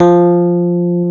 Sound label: F 0, Electric Pianos; Wizoo Electric Pianos